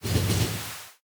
train tie; factorio 2.0.55

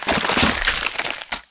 behemoth gore; factorio 2.0.55

